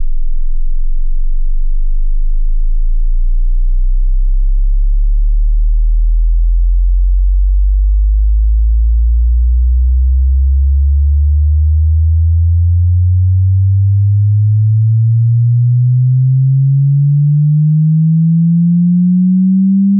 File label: bass sweep 20hz 200hz; speaker test